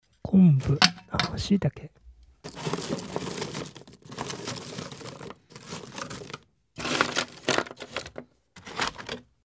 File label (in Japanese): gasagasa